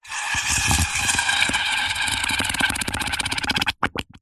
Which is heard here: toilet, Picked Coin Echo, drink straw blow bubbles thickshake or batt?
drink straw blow bubbles thickshake